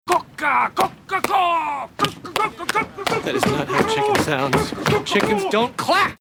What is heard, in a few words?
making chicken sounds saying thats not how chickens sound; buster bluth